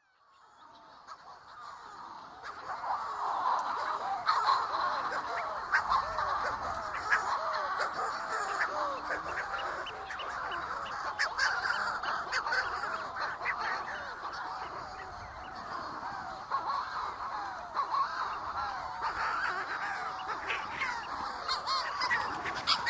manx shearwater

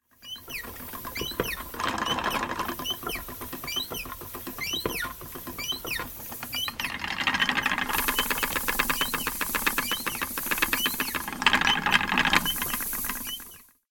spinning wheel

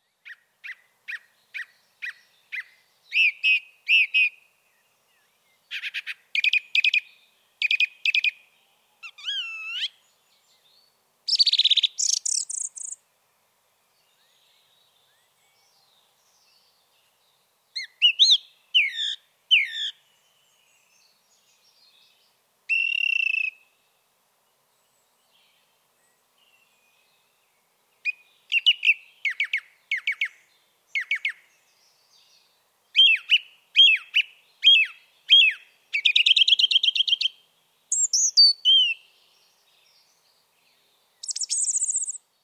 song thrush; recorded by richard savage

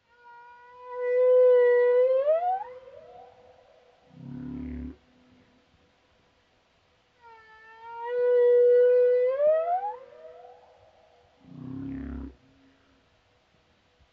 humpback whale

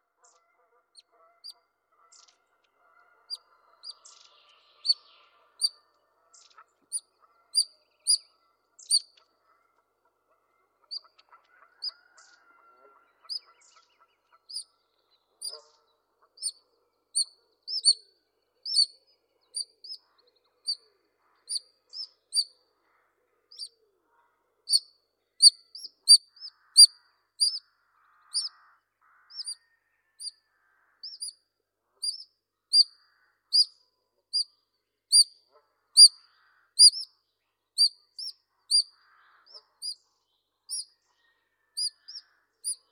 otters; british mammals

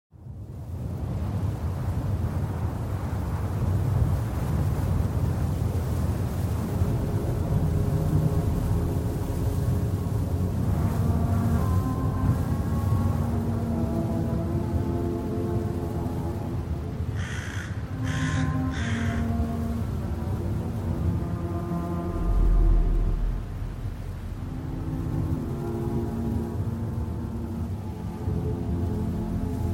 whitby abbey outside ambience; ben mowson

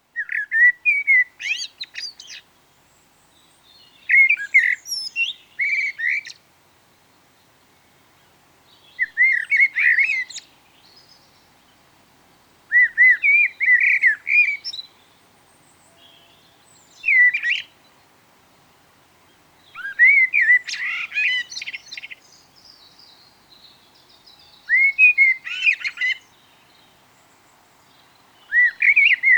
turdus merula; nobles

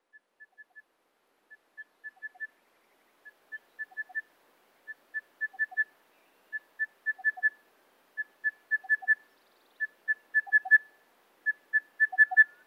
crested bellbird

